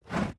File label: drawer open